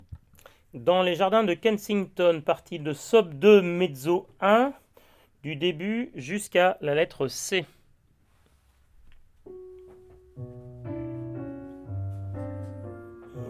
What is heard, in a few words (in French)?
Dans les Jardins Sop2 Mez1